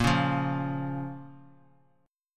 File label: Bb Minor; x,13,11,10; strummed; chord shape